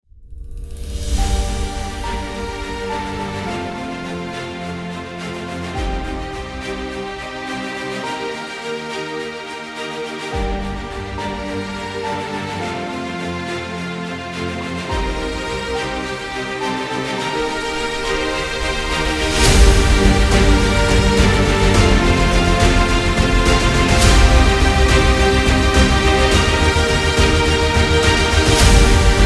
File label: Victory